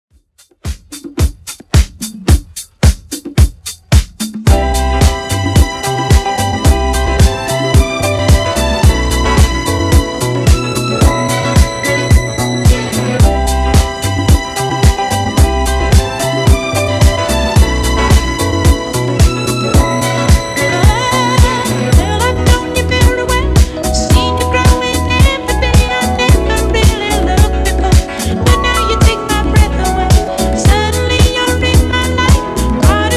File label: Redrum 110 Bpm